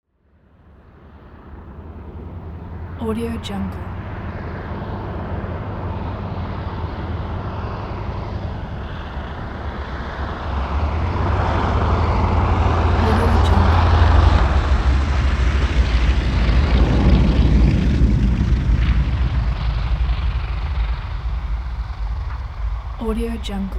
transportation prop plane ju52 approach land

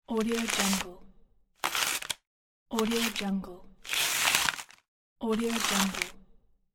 opening package

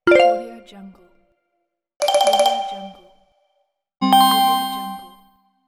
notification

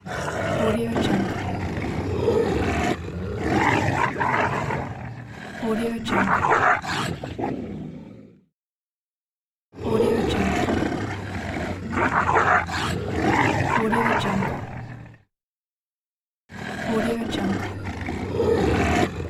dogs fight

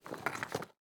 rocks

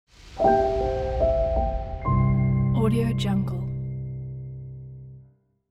logo with piano

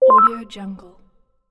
notification

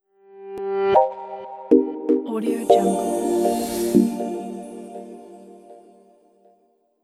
soft digital intro logo

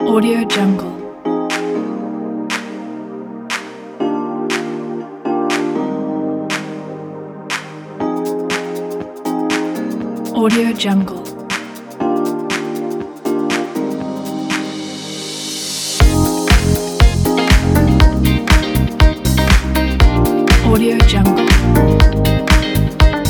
luxury fashion showroom funk

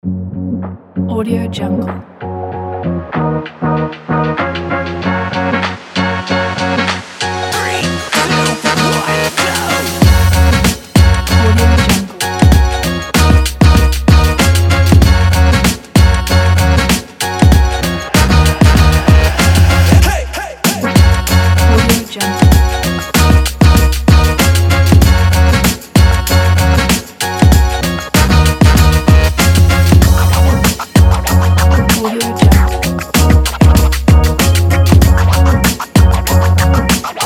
positive hip hop

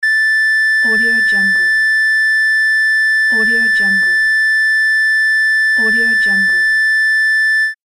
heart monitor long beep; ib music

